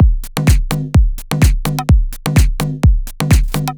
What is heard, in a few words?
C 127bpm, House Drumloops; Zero-G House Fabrik Drumloops; ZG Drumloops